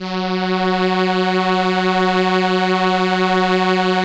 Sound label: ARP STR ENSL, First Call Vintage Keyboards; SE ENSMBL